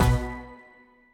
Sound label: Near Win Reel Bounce; fruitshopmegaways